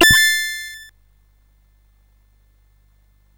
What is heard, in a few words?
Taipan sfx, CSG8580R5